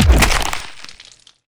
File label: Trapdoor Death Hit